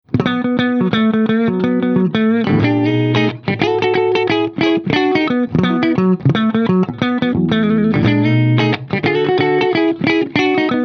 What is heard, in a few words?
ZIPPER HARD